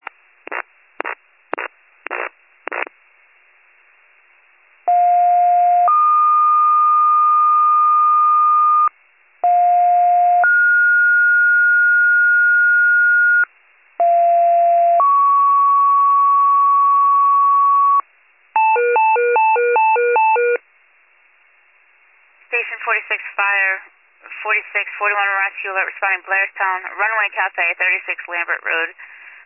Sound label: fire, pager alert